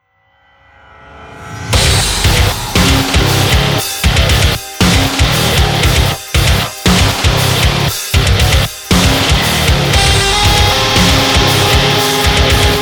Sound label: BGM RAID MATCH; Busou Shinki Armored Princess Battle Conductor; arcade